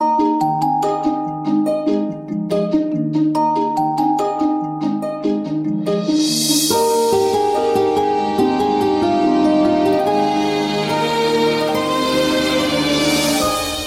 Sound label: huawei best orchestral ringtone